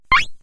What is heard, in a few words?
phase Jump; kenney digitalaudio